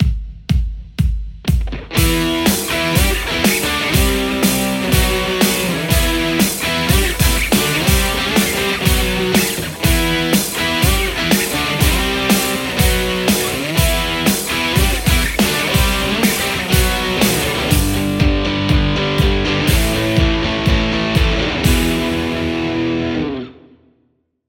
strat; tse x30

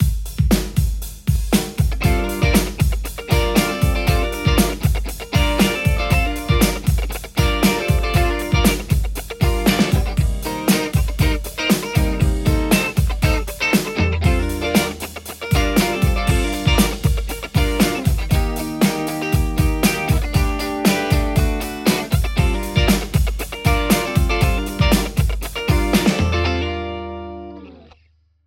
Les Paul JCM800 hot; mercuriall

